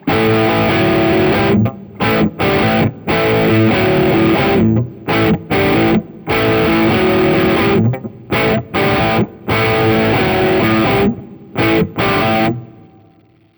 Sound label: lead neck; vintage